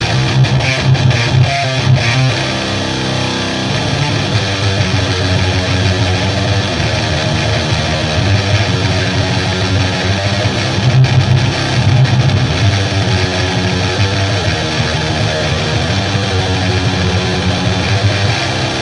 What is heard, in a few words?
lovely guitar